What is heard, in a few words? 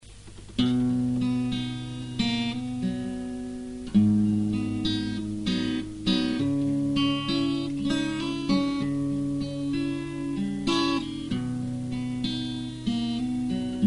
guitar